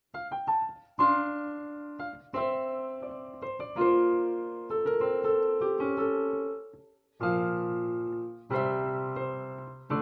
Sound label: Cover, piano